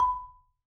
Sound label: SOFT B4, Marimba; Sampleheads; Dave Samuels Marimba & Vibes